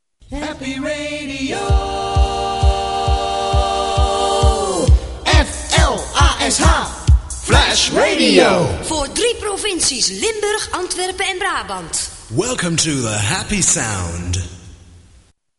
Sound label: jingle; Flash